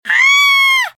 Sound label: Scary Scream